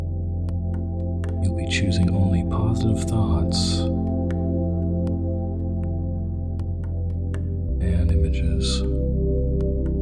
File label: positive energy With Tabla